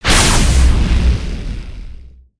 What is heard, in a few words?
Javelin Impact